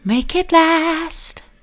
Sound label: make it last soft, vocal